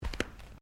FOOTSTEPS THONGS (FLIPFLOPS) CARPET STEP; Footstep Foley; Footsteps (Sound Effects:Human)

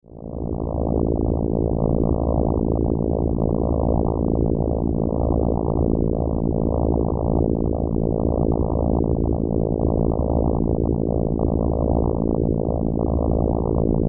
Science Fiction Electrical Power Source; Sound Design

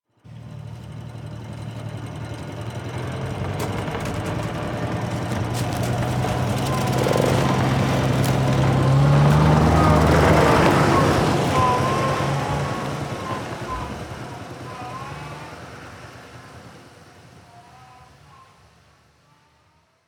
Jeep Backs Up On Dirt Road; Rattly 1980s Jeep Backs Up Down Dirt Road , Branches And Bumps; Cars; Vehicles

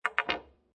Billiard Pool Snooker Put Cue On Table; Pool (Sound Effects:Sports)

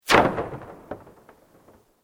Sweep Organic Fast

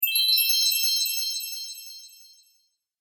Fairy Magic Sparkle; Magic Sound Effects

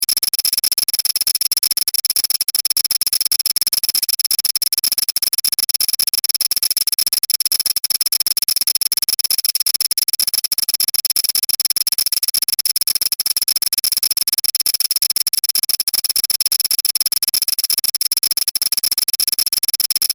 Science Fiction Computer Readout Distorted Beeps; Computer Sound Effects; SFX Bible 6

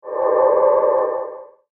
A Little Creepy Ambience; Scary Soundscape Sound Effects; horror sound effect

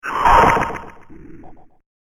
Outer Space Suction, science fiction

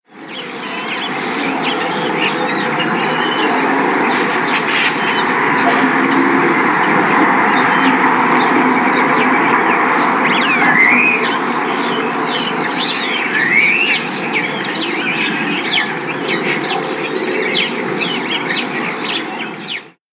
Bird Song In Spring Coastal Town Ambience; Bird Sound Effects; Outside Ambience Sound Effect; Andrew Fleming

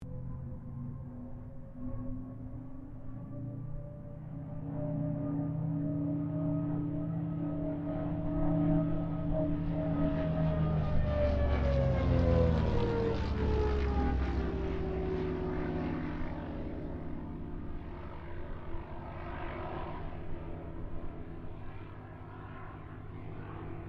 Kittyhawk Boomerang pass, Airplane; Mixed aircraft squadrons; Airplanes (Sound Effects:Vehicles)

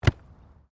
RUGBY BALL DROP KICK; Various rugby sounds; Miscellaneous Sports; Foundation4

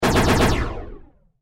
Science Fiction Laser Cannon Four Shots Gun; Lasers

